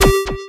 hitsound retro

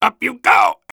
flip exert; Soldier; taunt

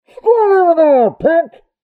airblast; Pyro

